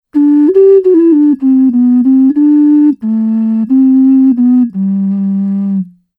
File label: Great Bass jingle, ocarina